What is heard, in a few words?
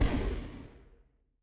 magic scifi laser